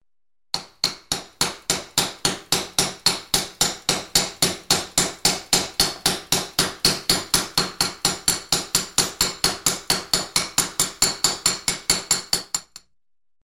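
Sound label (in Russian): зубило удары молотком по зубилу в помещении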